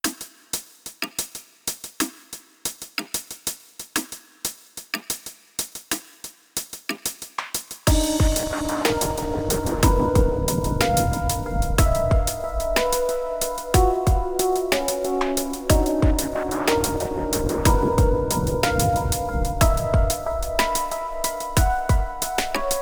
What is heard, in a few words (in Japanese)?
動揺している時; World End Economica complete